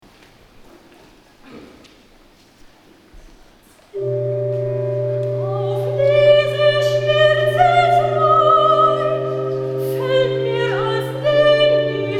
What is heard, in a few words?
Recitativo S; BWV199 Mein Herze schwimmt im Blut